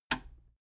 metal button radio release power on

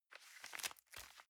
envelope swap soft

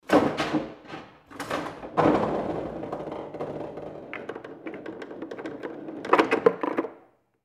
billiard playtable release

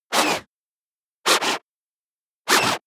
scratch

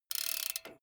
bmx bike crank freewheel slow long

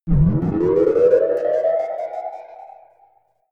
alien alarm signal

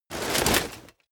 plate armor movement rustle

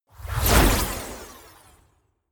whoosh short jinx

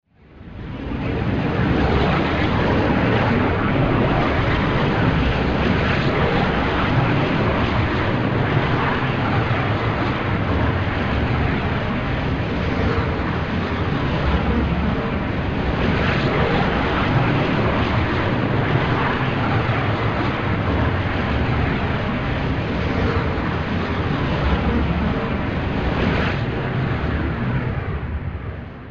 time storm

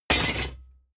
plate armor piece drop

